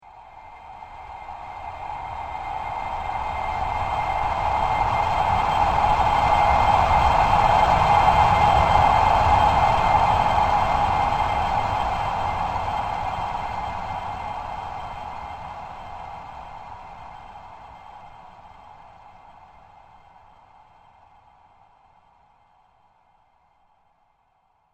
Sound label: Strong Metal Transition; Transition Sound Effects